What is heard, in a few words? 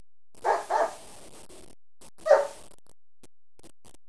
DOG; animal sounds